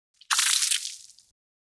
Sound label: Blood